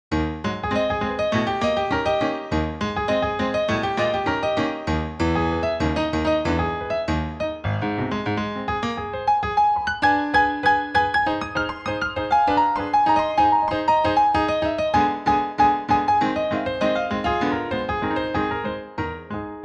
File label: Maple Leaf Rag, piano